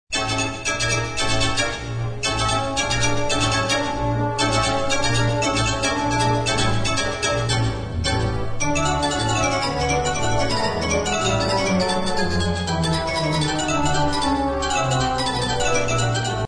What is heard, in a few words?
theatre organ